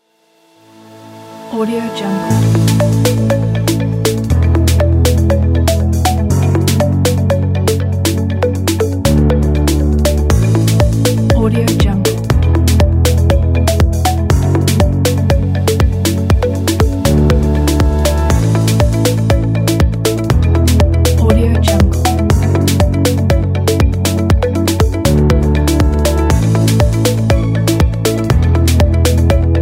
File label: modern soft summer